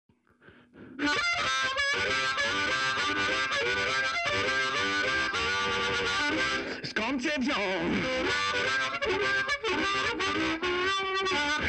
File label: heavy blues blue harp blues